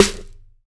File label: nice snare one shot g key, acoustic snare sample tuned to the G note; snare drum samples